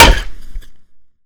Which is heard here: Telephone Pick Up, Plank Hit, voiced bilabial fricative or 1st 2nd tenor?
Plank Hit